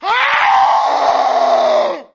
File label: faceless attack; mesa facility